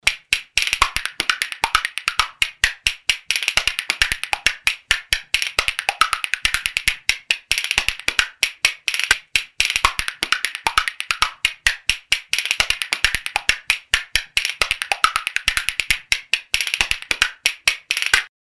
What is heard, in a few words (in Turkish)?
kasiklar